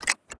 Switch Machine; D4DJ Groovy Mix; Android, iOS, Mobile

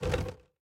Bollard Lower Stinger

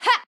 Swipe Normal; Jackie

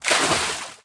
Hoverboard Loop Water Splash In

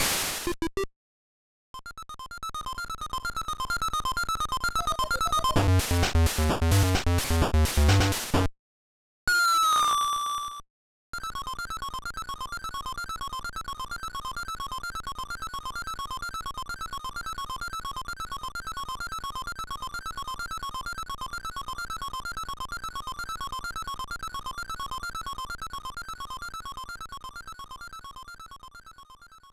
Game Over

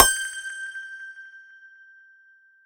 UI Menu Element

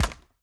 Bounce Electronics

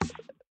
Bomb Gun Fire; Going Under

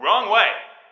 Wrongway; Test Drive Off-Road 3

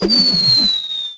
Sfx Buzz; Jonah A VeggieTales Game

